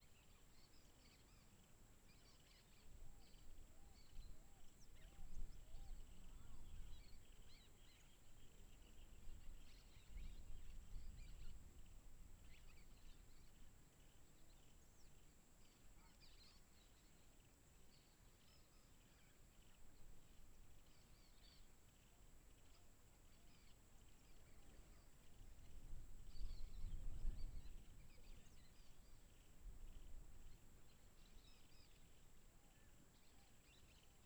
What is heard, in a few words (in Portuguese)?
Vento suave com passaros e grilos